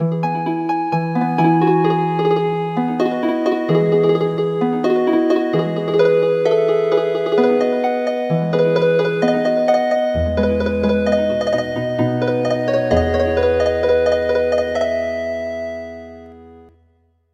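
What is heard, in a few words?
Healing Harp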